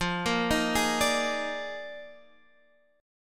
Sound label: D sharp Major 7th Suspended 2nd Suspended 4th F, chord